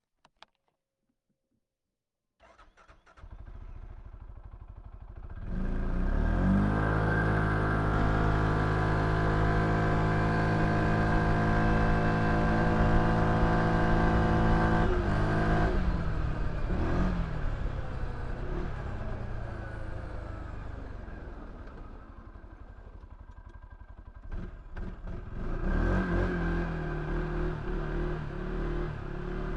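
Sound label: textron alterra mudpro 700 onboard fast start drive stop reverse stop drive stop off engine; 8020; Sonniss GDC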